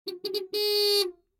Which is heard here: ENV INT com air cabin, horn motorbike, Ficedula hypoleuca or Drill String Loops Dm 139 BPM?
horn motorbike